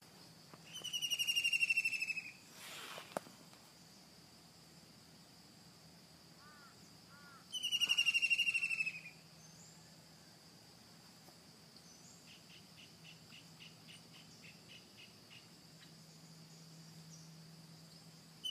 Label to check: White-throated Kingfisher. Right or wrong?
Right